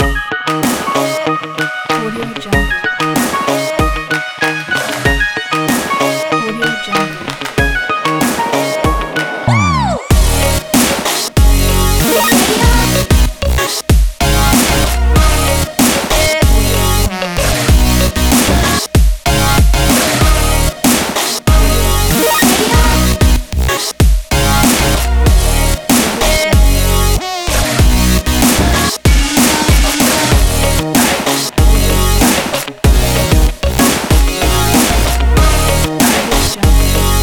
a future bass; audiodarts

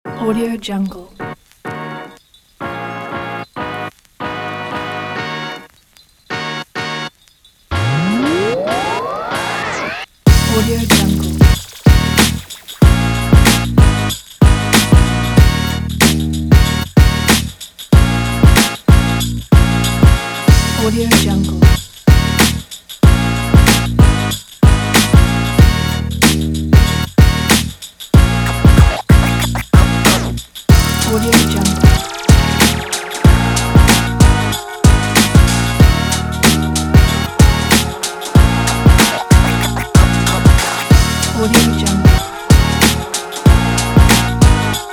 the hip hop beat positive

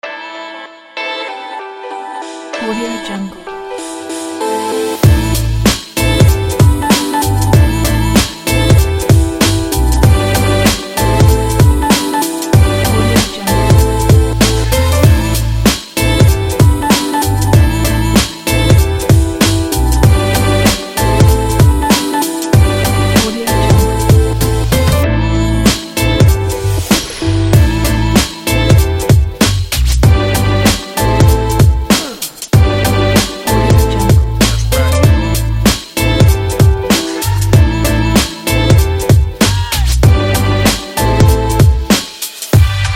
the hiphop

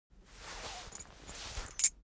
cloth key ring small trousers pocket fetch; foley; smartsoundfx

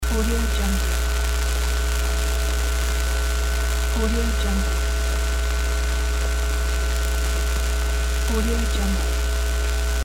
electricity

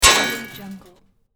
hit metal destroyed

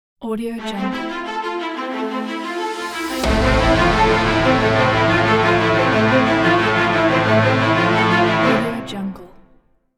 cinematic logo; orbion

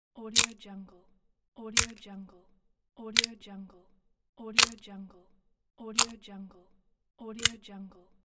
fingers break

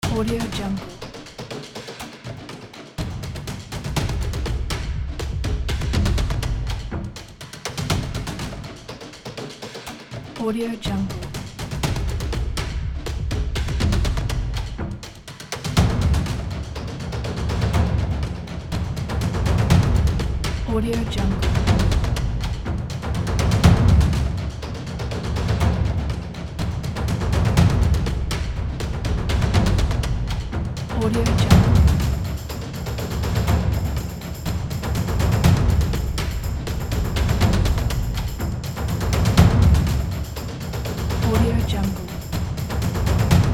epic percussion

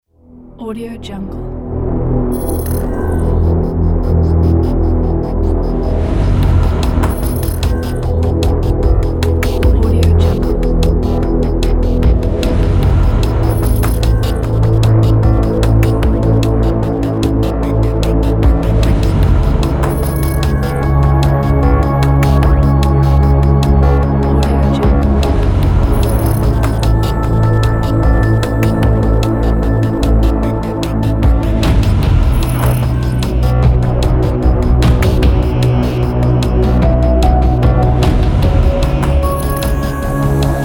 scifi cinematic

